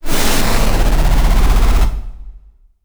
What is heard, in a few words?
Snake Boss Charge